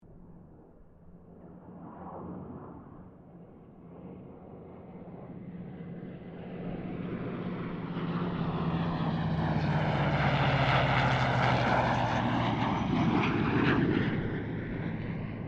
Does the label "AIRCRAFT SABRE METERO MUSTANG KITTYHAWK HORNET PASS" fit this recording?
Yes